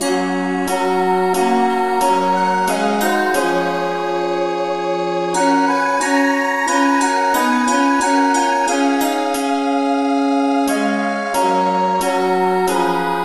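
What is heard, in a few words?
rev no vocal key F sharp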